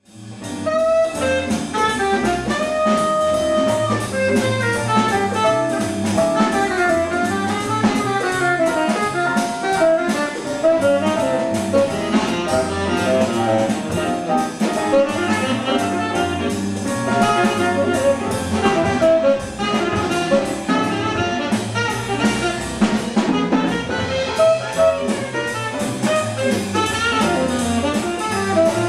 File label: jazz quartet piano bass drums saxophone